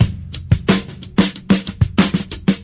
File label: 121 bpm breakbeat sample c sharp key